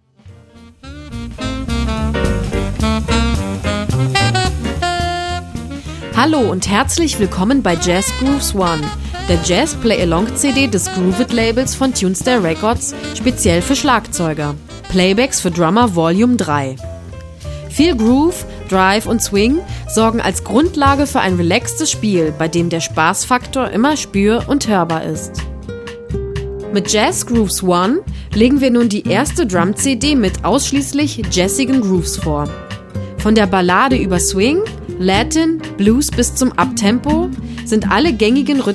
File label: Trailer Drums Jazz Grooves